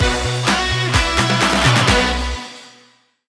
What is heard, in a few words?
menu stinger; Power Rangers vs Teenage Mutant Ninja Turtles - Ultimate Hero Clash 2